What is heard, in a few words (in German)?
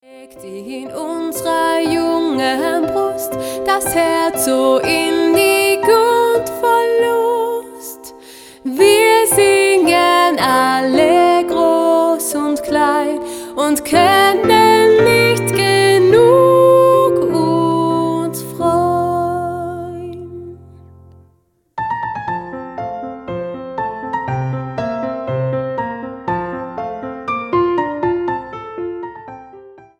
Weihnachtslied